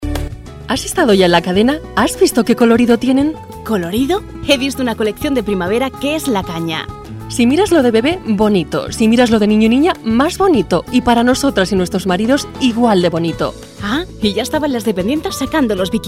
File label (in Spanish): cadena mus